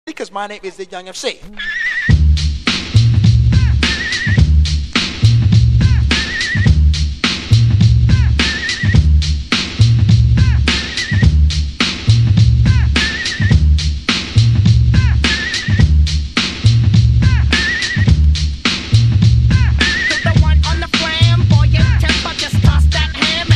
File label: hip hop set live; hip hop hour; cardiff